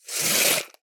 Measuring Tape; SoundBits; Tiny Transitions 2

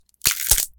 Bone Cracking; Membrans; Bone Crackings Sound Pack 01

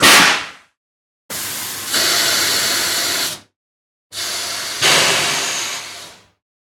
Steam Blasts, Trains; The Sound Pack Tree

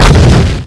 explosions; hl2; impact

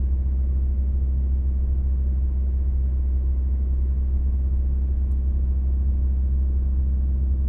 Audi A3 8L 1.9 TDI engine 2k rpm loop interior; stereo; Soundholder

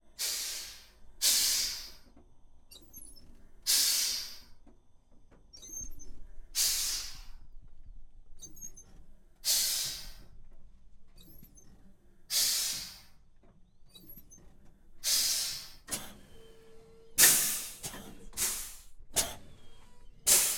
Truck Ural Details Air Exterior, URAL 4320 Diesel; FLYSOUND

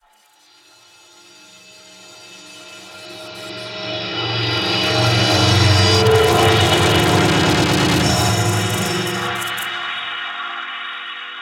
stinger entropic swell; Soundiron; Sick 6

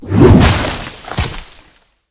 Monster Tail Hit on Chainmail; Monsters

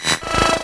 security yes, roller; hl2

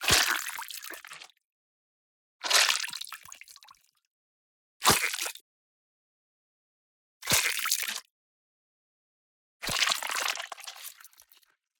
Gore Splatter; Justsoundeffects; Gore Mini Pack

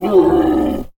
ANIMAL WILD Camel, Grunt, Groan, Roar, Expressive; Articulated Sounds; Camel Growl Vocalizations

